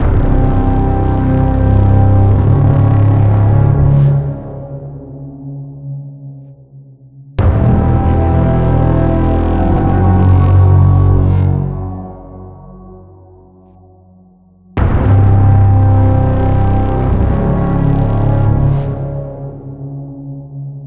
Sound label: entrance open gate, music